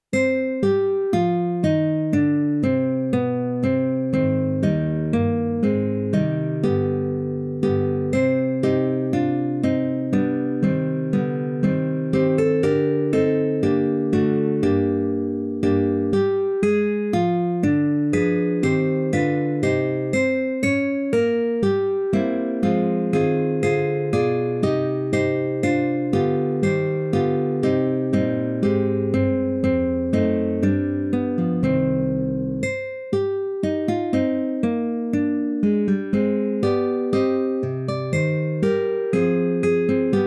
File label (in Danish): orgelkoral; Kom, hjerte